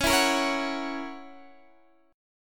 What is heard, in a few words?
F sharp 7th, guitar